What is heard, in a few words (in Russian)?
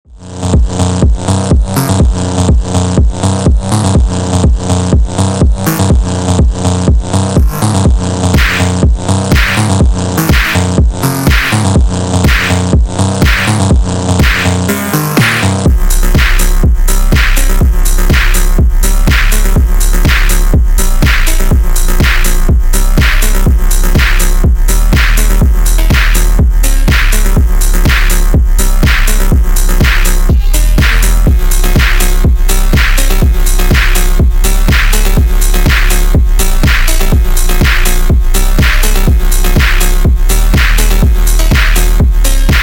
Bass, ЖЕСТКИЙ ФОНК